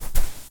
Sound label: footstep sand run